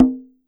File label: conga hi; E-MU Producer Series Vol. 7 – Old World Instruments